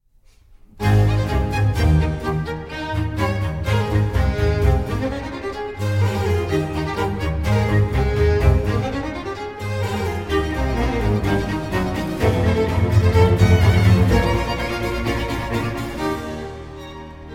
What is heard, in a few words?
concerto for cello strings and basso continuo in a major allegro